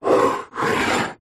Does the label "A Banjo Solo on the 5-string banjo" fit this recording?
No